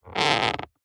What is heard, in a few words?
foley leather or similar squeak creak short; zapsplat